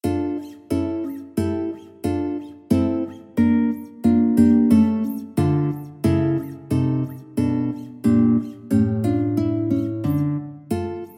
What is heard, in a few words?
Allegretto, guitar